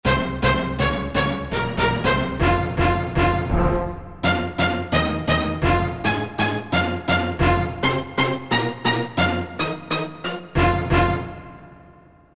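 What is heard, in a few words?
Orchestra Hit, Roland MT-32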